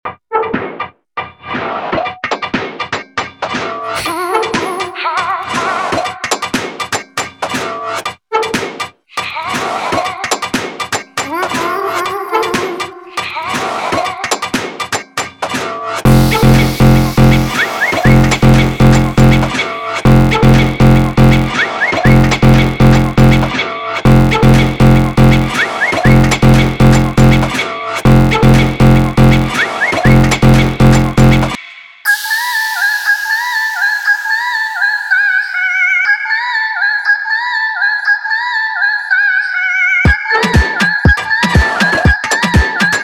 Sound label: beat 120bpm